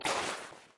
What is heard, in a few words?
draw, weapons; garrysmod